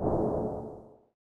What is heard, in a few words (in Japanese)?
足音; LcdDem SFX